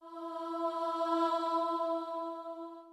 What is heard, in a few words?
starting note, voice; subito piano